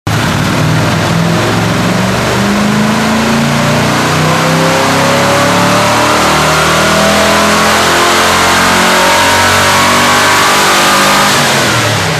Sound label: Quick clip of me on the dyno with turndowns, 1977 Ford Mustang II; cobra